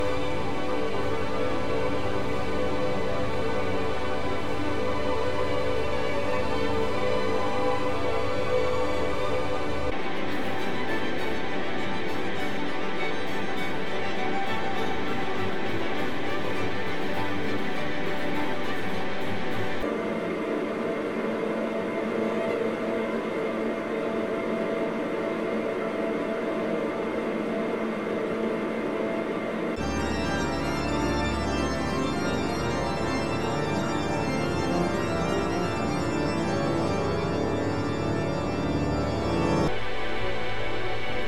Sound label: classical noise